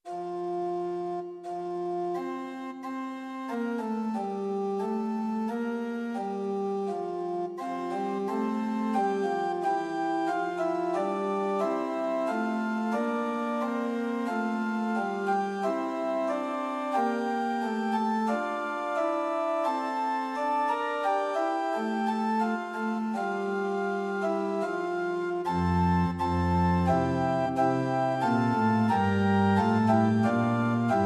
Liturgical Organ Pitch 440; Prinz 8 4; hymn

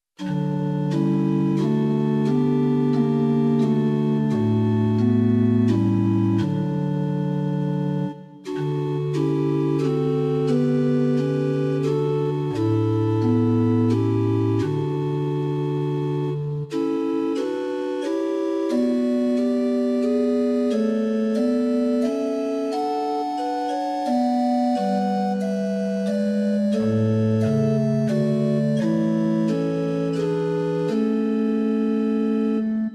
Prelude, Pitch 440 Temperament Equal

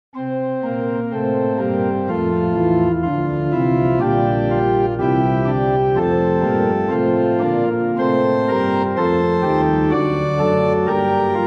Organ Books, Equal; hymn

